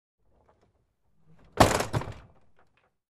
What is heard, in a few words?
wooden door closes